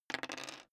dice roll table

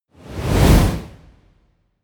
whoosh

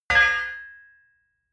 hammer bounce ring

